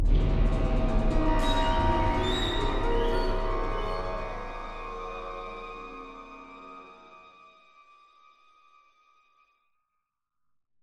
Music Stingers stinger Shadow; Hunted: The Demon's Forge; x360

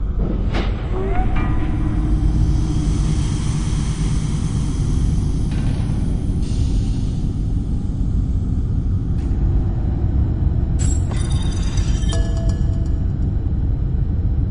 Ambient, Pirate Constructions; rayman 2

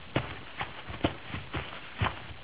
Walk; Five Nights at Freddy's; FNAF 2

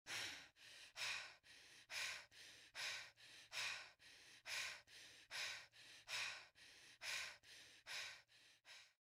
female heavy breath

